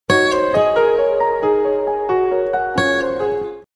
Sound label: Power On; ZTE; X920